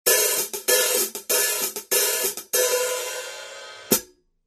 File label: zildjian